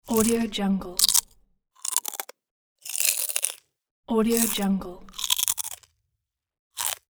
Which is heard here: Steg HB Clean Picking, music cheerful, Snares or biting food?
biting food